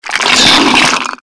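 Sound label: skeleton attack; c skeleton atk2; Skeletal Ravager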